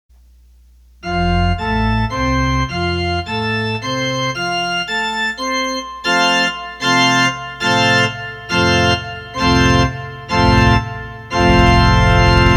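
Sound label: Cathedral Organ, Roland accordion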